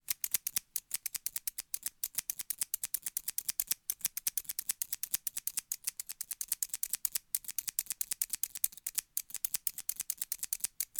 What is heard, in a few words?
scissors thinning cutting hair, household